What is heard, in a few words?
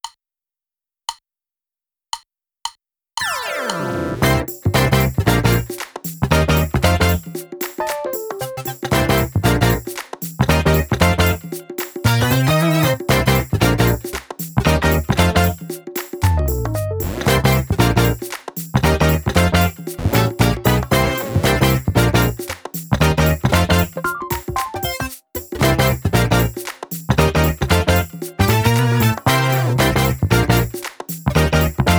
Quick Little Funk drumless